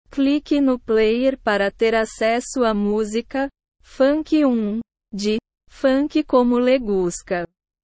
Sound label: funk hum